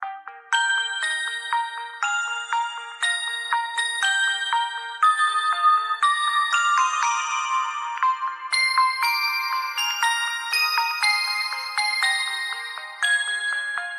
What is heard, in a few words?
A Toy Box, Ringtones; Samsung SCH-W420